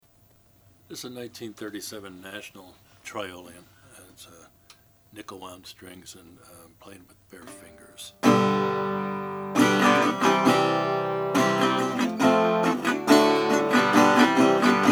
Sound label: national triolian rosewood